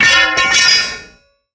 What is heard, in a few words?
drop weapon